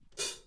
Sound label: H-H Chick; cymbales